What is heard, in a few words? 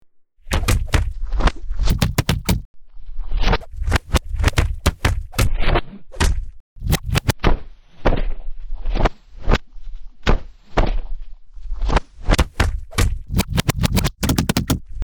noise